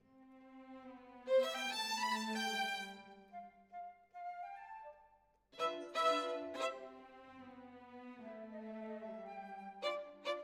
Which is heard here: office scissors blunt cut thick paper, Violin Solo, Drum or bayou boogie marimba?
Violin Solo